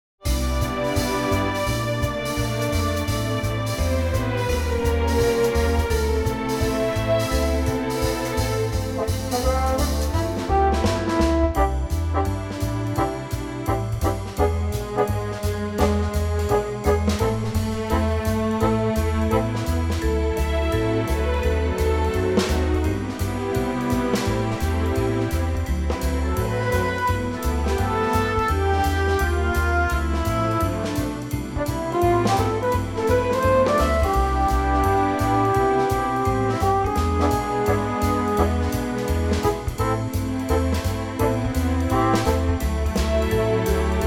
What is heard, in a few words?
Orchestral; key - C - vocal range - G to C